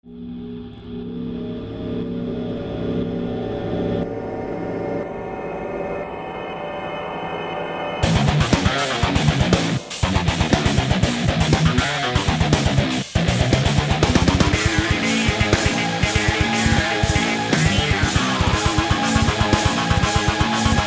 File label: short instrumental metal track